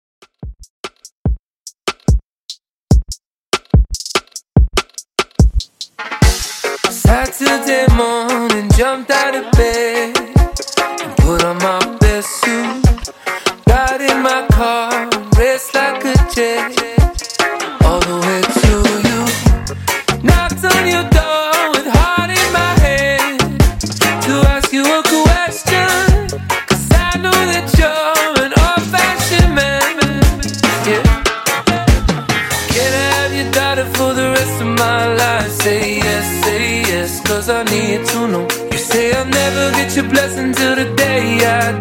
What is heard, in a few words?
Reggae Fusion, 145 Bpm